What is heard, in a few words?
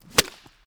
Whipcrack; افکت صوتی